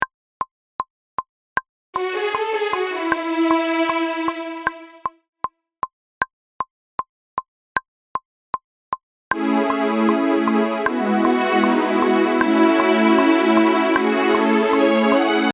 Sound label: Strings